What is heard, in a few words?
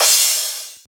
Crash